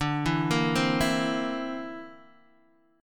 D 7th Suspended 2nd Sharp 5th D, Guitar DADGAD

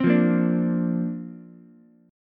D-Minor 7th Sharp 5th, D, Ukulele; Dm7#5; strummed

